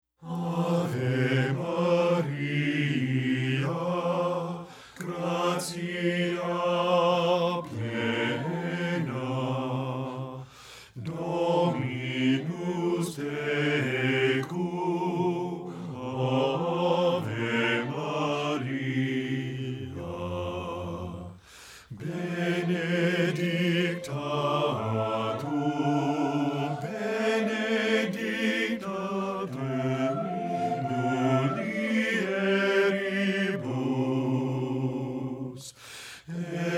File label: AVE MARIA BASS